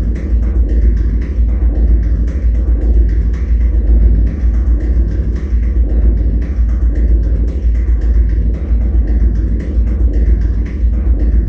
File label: Ring Large Loop